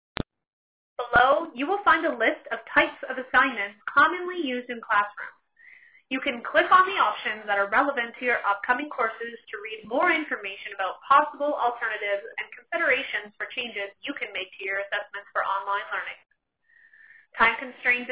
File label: Accordion